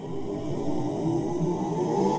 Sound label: combo; netent